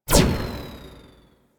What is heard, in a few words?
Turret Tether Loop Stop; Warframe; Zariman